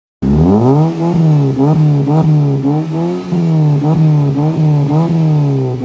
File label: Changan, اگزوز